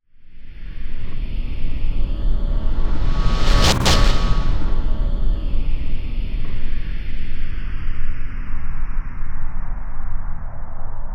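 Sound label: Hard Smash forward reverse, Cinematic Metal Impacts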